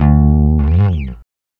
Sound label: bass, disco; Best Service ProSamples vol.48 - Disco Fever; AKAI